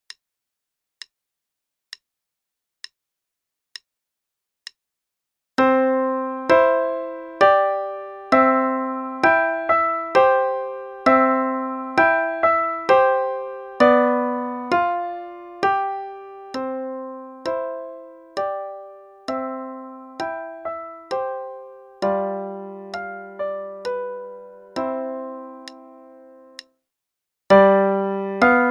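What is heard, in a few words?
Play-a-long, Waltz, quarter note=66; Kohler; Waltz of the Young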